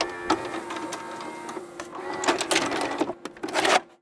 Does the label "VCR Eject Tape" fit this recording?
Yes